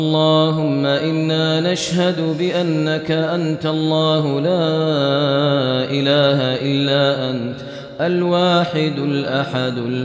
Dua Islam; Islamic Ringtones

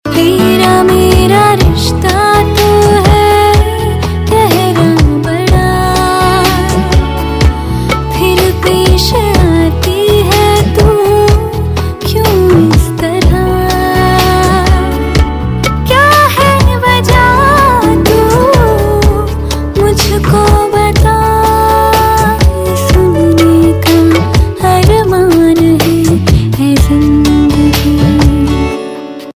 Ringtone

